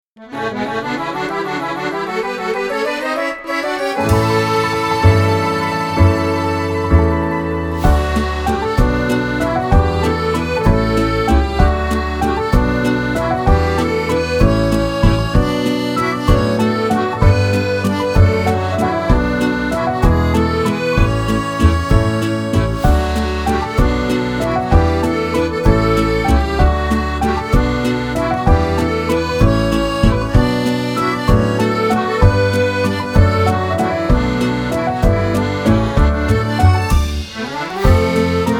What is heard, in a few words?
french france paris music